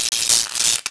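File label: ambience electric arc; Star Wars Knights Of The Old Republic II; XBOX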